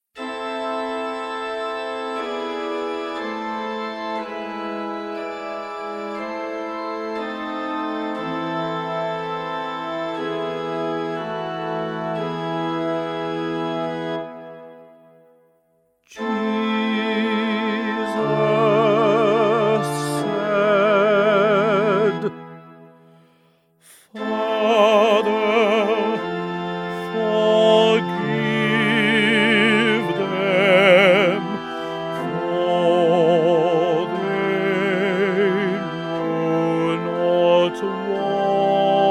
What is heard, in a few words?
Concert Version